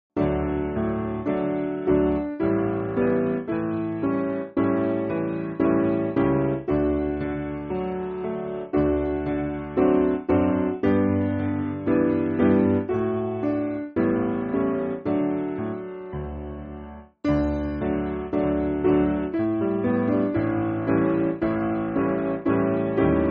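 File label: Piano, Music Backing Files for Churches; God Is So Good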